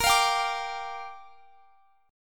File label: A sharp 6th, guitar chord; A#6; strummed; x,x,20,22,20,22